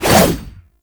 Bayonet Melee Combo; Warframe; Tenno

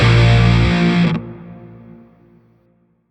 Shawzin Chromatic Chord; Warframe; Grineer Shawzin

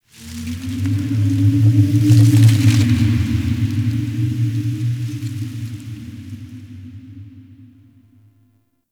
Zariman Park Wind

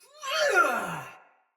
Battle Cry Soldier Mace Agent Female; Sinecure Melee

